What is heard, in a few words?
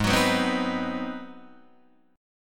G sharp 7th Sharp 9th, Guitar, Open D